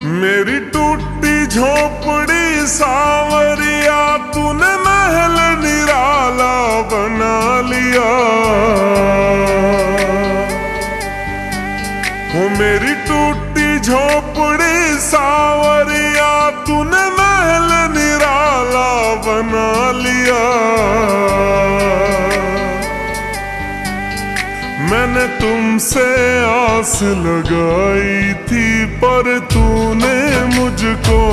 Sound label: Ringtone slowed and reverb